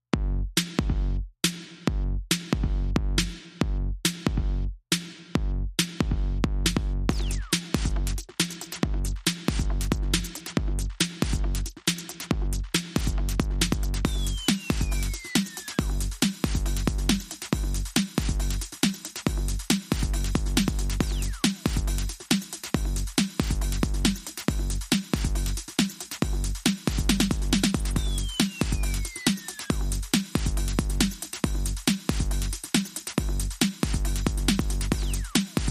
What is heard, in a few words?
Bonus Beats